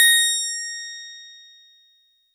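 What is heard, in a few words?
Easy Clav DX100 A#0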